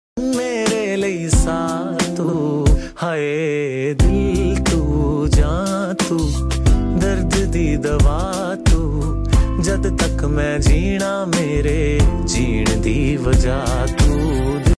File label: lofi